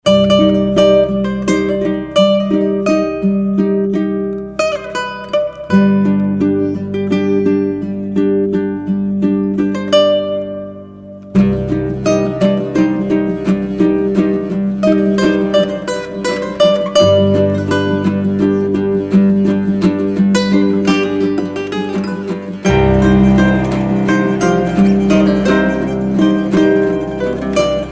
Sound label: Somber Ensemble